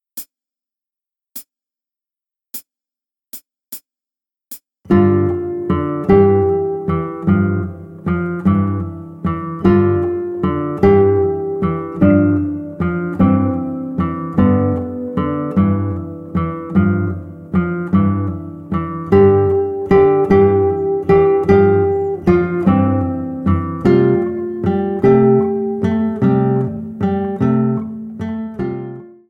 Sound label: Stop Note Blues, Play Guitar; Play Guitar Together 1